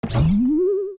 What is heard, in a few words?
bonk; svencoop; zelda